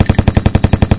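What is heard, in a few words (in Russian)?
звук старого пулемета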